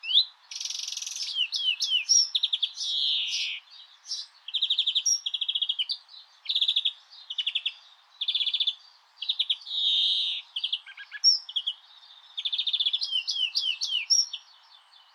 Verdier

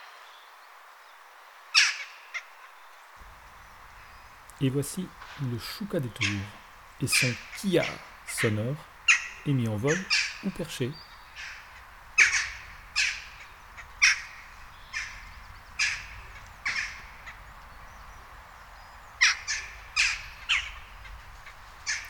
choucas commente

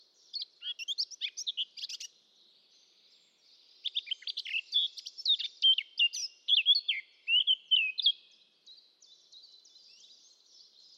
Fauvette

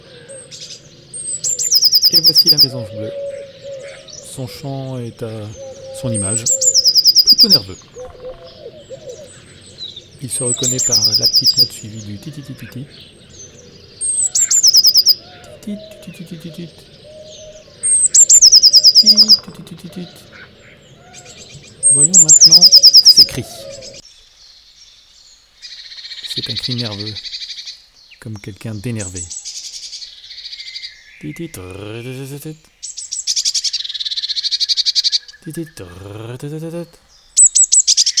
fond mesange bleue

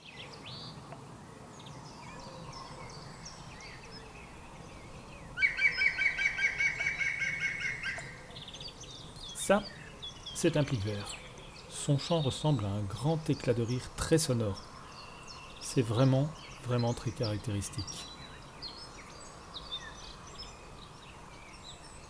pic vert audio commente

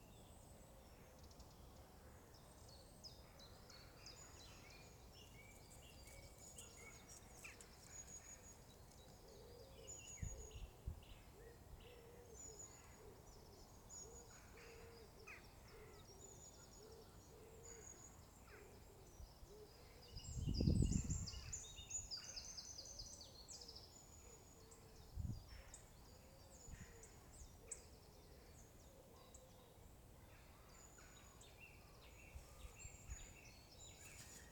pigeon

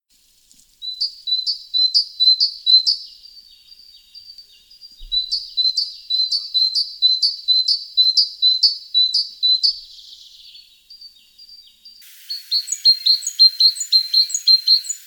Mesange charbo sec

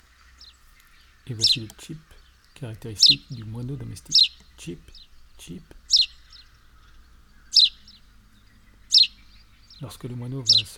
moineau commentee

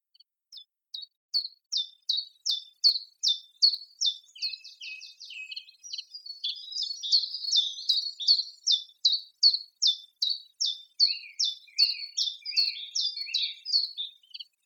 pouillot sec

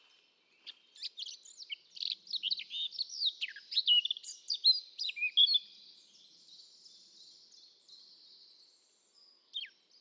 fauvette

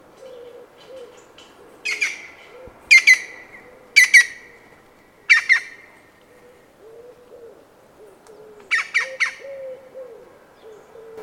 Pic vert envol

